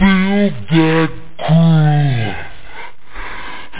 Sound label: Vocal; Amiga 8-bit Sampled Voice